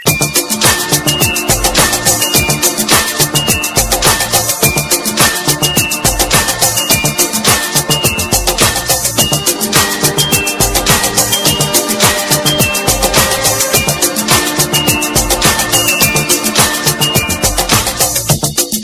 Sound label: zvuki tehno vertu